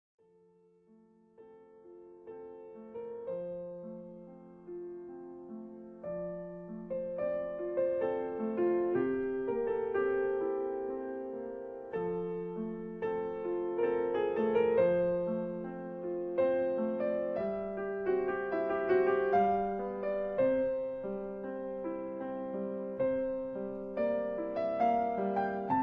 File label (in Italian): Romanza senza parole per piano